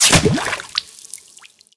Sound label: bullet hit water; War Thunder